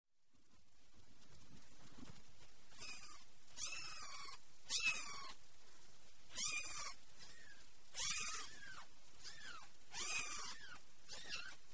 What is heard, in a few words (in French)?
Chatons